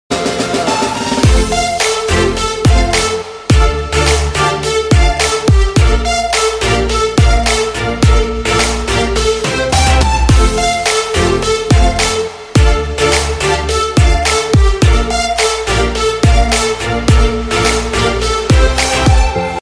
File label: Fusion Music Ringtone